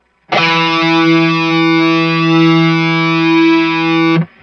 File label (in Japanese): コーラス